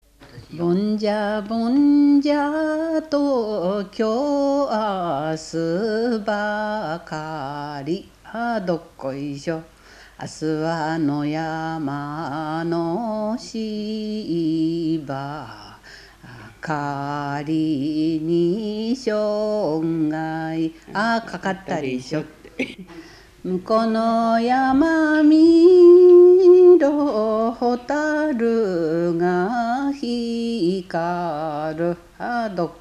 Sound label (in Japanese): bonjabonja, minyou